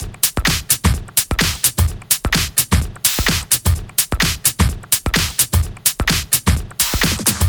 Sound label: 128BPM Kit; Vengeance Samples; Pitchford Kit